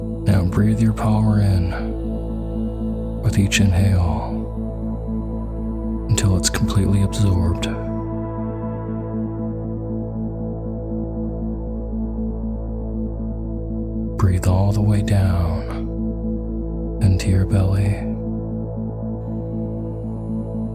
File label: Personal Power With Isochronic Tones